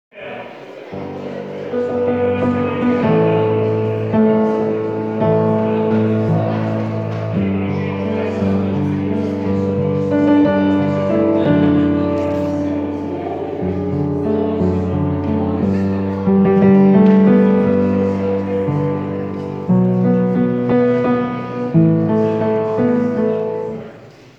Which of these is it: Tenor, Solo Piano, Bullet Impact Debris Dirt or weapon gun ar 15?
Tenor